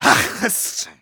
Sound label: medic jeers, de